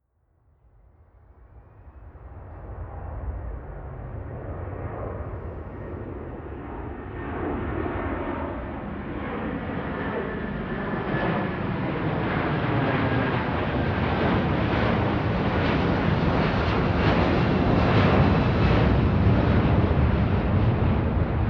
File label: plane flyby land; flugzeuge verkehrsflugzeuge; verkehr transport